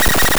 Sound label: call